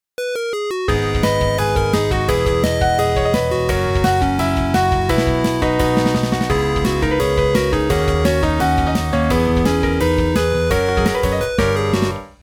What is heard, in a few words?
Piano